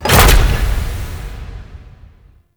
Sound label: Room Lock; Orbital Station; Caliban; Warframe; Tau